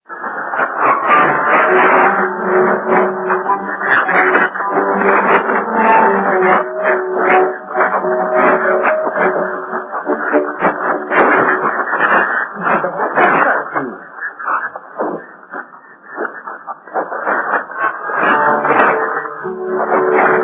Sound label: 9525KHz; VOT